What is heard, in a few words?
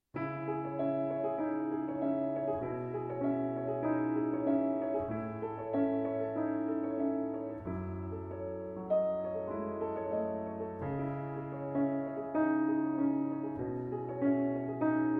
Solo Piano